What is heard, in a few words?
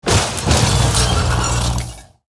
Junk Racer Underlay Death